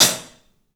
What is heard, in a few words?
HAT, CLUBHAT, 3D Drums; 3D Sound